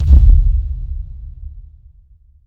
Kick electron verb

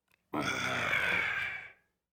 Grunt alien

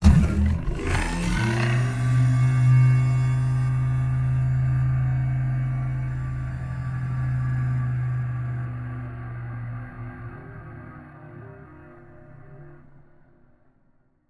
Time Morphing

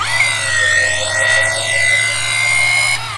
Robotics Move

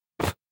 Blow

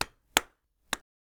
Face Slaps

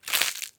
Lettuce Crunch Quick

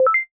Sine Octaves Up Beep

